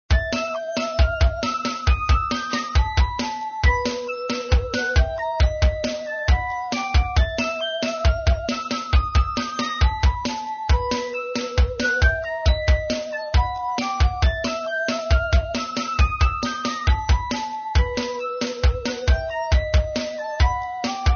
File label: Loop Slow; Trick or Treat Smash Online; Windows